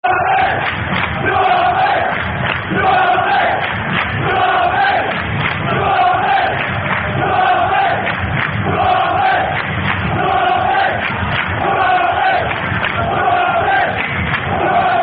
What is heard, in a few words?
Gillingham, Blue Army Football Chant (Soccer Song)